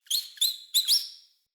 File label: Rat Squeak; Ambient; Vocals; Verminia Messenger Rat Squeak F; Animals